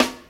old school funk acoustic snare sound A sharp key, Royality free acoustic snare sound tuned to the A# note; Loudest frequency: 1572Hz